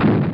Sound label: yoshi Pound End; N64; YOSHISISLAND